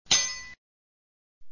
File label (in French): texture cailloux metal